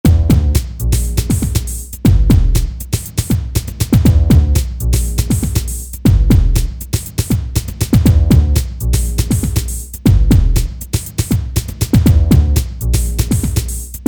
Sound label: Drum Bass